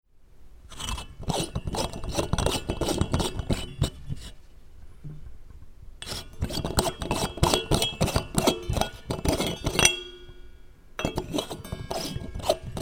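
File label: electric bulb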